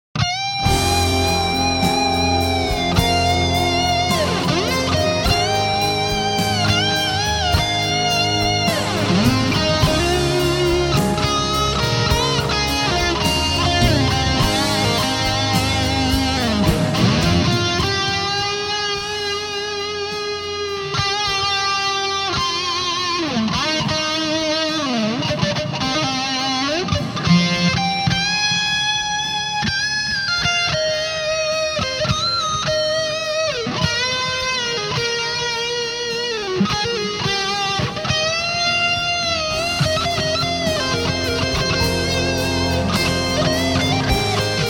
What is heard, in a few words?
solo EMG Strat Muff; GRMuff; Cel75